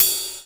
ELE RIDE, Drums Dance & Groove; USB Soundscan vol.11; AKAI; ELEC. KIT

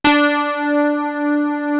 acid piano; East West - Global Sounds; S.I KEYS

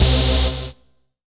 RAVE, RAVESYN

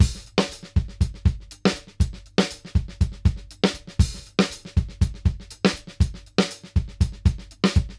dry 120, Beat Loops; Zero-G; Monster Beats

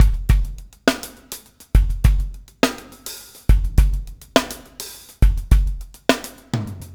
PEAK, Backbeat

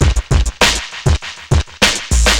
drloop, drumloops, Vinyl Attack; Masterbits

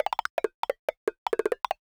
Random Blocks; musicradar; cartoon caper

